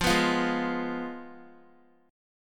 F Diminished Guitar; Fdim strummed; JGuitar

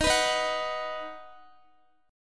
D sharp Minor Sharp 5th D sharp down Guitar; strummed

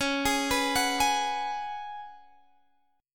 Gb Suspended 2nd Suspended 4th Db

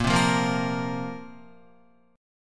Bb Suspended 2nd Bb down Guitar; strummed

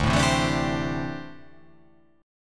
D 7th Suspended 2nd Suspended 4th D

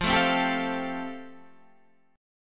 F Major Add 9th, Guitar Standard; Fadd9 strummed; JGuitar

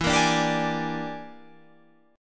E 7th Sharp 9th Flat 5th, chord

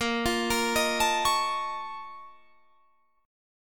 A sharp Minor Major 11th, A sharp, down

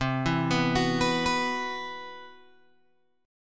C Major 7th Flat 5th C, Guitar Open G

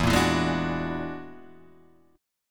F sharp Augmented 9th E, chord

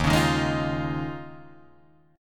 Eb 9th Suspended 4th, Guitar, Drop D

